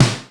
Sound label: Snare E5, Roland U220; drum machine